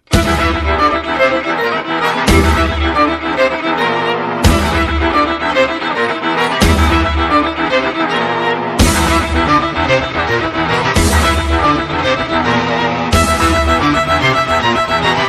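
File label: violin game ringtone; DRAGON DOOM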